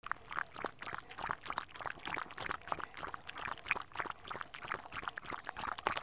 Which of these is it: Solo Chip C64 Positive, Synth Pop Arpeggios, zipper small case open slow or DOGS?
DOGS